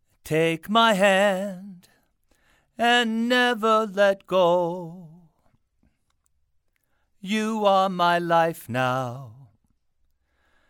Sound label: Male Vocals; Gemini II